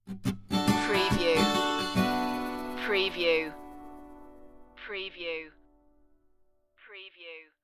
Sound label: perfect day stinger